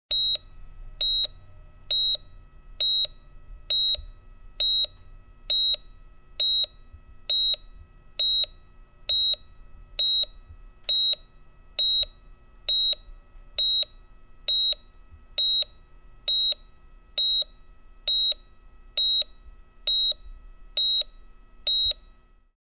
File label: Medical device beeping tone